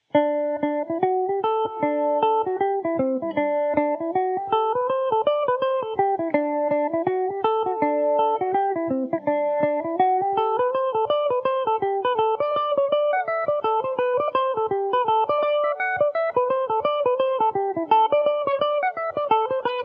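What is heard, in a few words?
D mixolydian, Irish mandolin; girls will you take him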